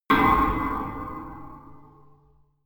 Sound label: metal rail